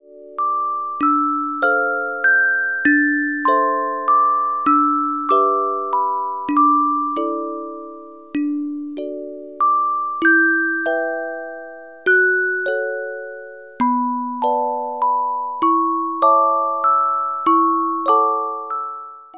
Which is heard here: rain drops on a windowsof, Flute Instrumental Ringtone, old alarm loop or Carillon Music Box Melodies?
Carillon Music Box Melodies